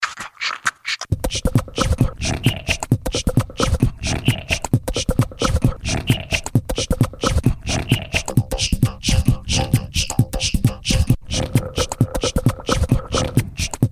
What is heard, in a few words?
Acapella